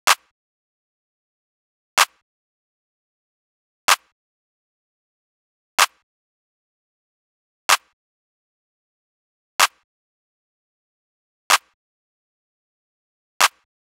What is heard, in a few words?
Drum Loop 126 BPM Clap, Hip Hop; Drum Loops; Loop Stems; Cymatics; Casa Drum Loop